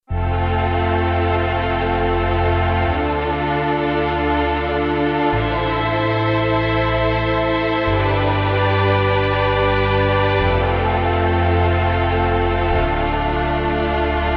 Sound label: Strings, Roland JD-990; Starless Strings; Vintage Board